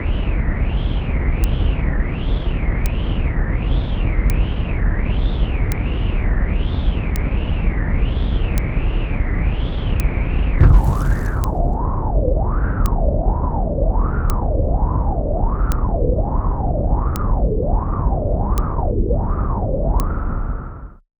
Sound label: Tidal Cycle eruption and daily Clicks